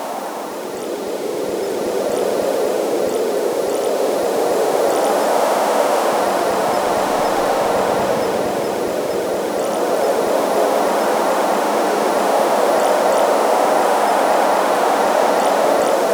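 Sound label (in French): vent dans le désert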